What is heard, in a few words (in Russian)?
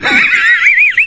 smeh